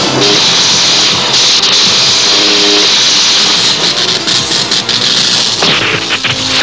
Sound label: death; quake2; starscream